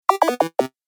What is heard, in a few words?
Retro Gaming Sound; Video Game Sound Effects